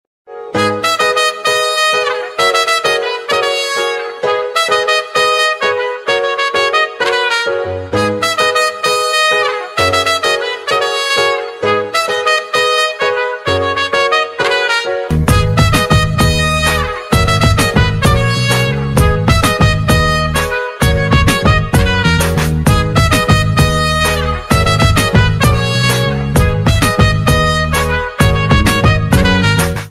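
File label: telugu best ringtone tamil love background music ringtone love ringtone; super khiladi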